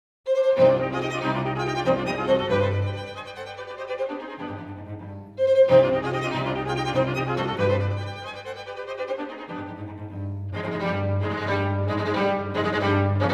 String Quartet No. 10 in E-Flat Major, Op. 74 Harp - III. Presto; Ludwig van Beethoven; Beethoven - String Quartets Nos. 1-16